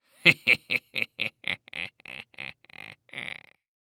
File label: Human Male Laugh Sinister Villain Laugh